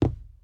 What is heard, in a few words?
Footstep